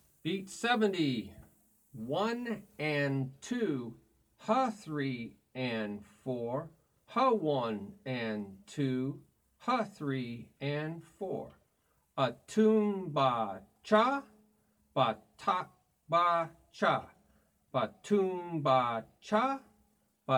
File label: Beat Toom Ba Cha Ba Ti Ba Cha Ba